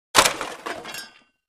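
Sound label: Junk Pile Impact Small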